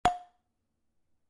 bellplate corner; bellplates

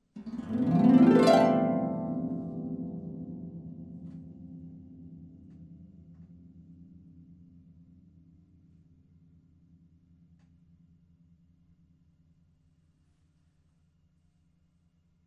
harp analysis

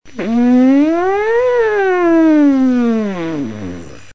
lips, brass

